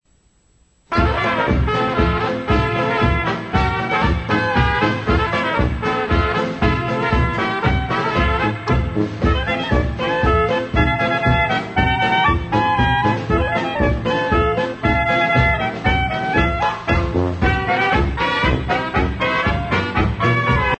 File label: SNAPPY POLKA